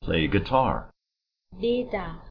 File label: guitar